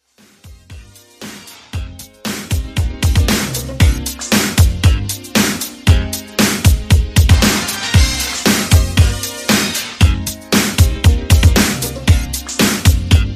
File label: 80s Redrum